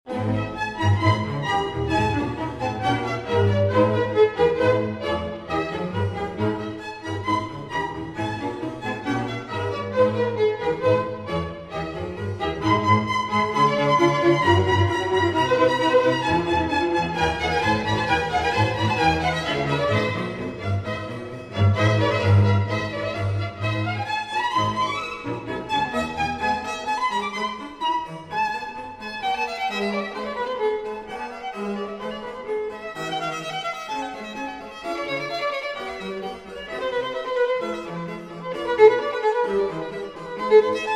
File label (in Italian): Concerto Per Violino, Archi E Basso Continuo in A Minore